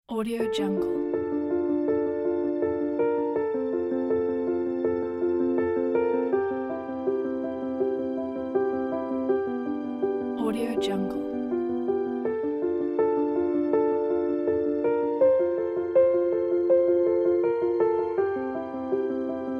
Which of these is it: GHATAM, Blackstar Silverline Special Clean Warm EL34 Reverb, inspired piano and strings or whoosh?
inspired piano and strings